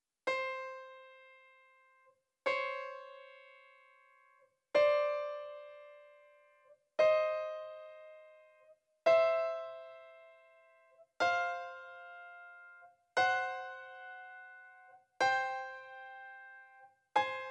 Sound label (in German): 12 INTERVALLE VOM GRUNDTON AUS